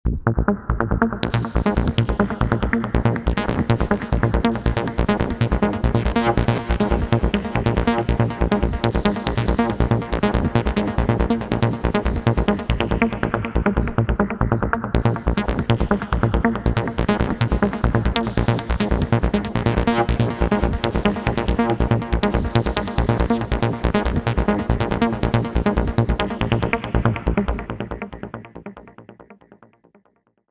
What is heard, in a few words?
vsti sounds, external fx; deepsonic microdeep one